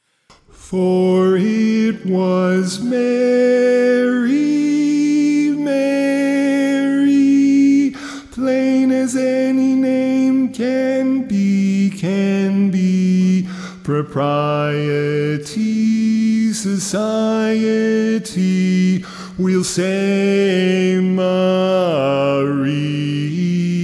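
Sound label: Baritone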